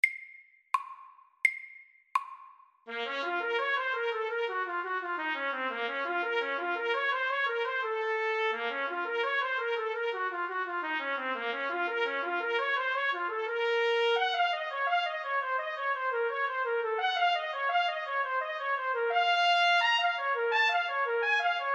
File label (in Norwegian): G moll etyde MIDDELS